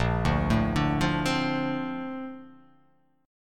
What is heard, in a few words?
A sharp Major 9th, down